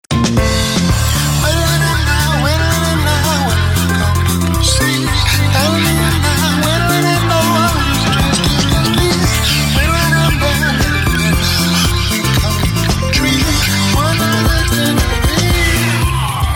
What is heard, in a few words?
Disco Varied